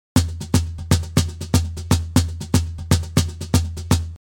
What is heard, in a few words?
Cajon Percussion Loop Processed 120, deep organic percussion; basic wavez deep organic percussion